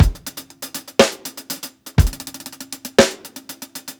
120 HALF TIME ROLLS, DRUMS LOOPS; BASEMENT DRUMS VOL 2

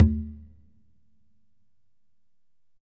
Congas wet, drums percussion

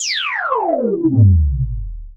sweep, SCIFI; Techno Trance Essentials

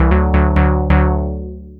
2 Step 133 SYN, House

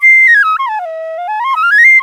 INDIA PICC

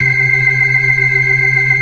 FAST VIB, ORGAN, TREMOLO VIB

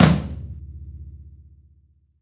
Rock Flam Tom, Roland BK-5

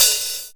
Gated Kit, Percussion

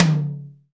TOM TOM, Real

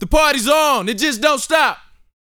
VOCAL, R&B Dance, HIP-HOP

